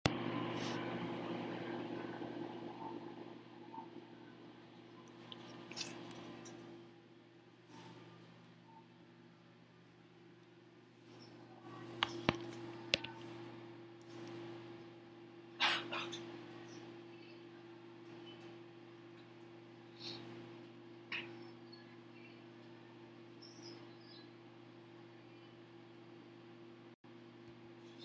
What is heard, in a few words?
Kitchen